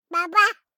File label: BABIES TALK Boy 12 months Baba; Baby Boom